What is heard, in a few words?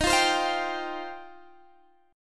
F 7th Suspended 2nd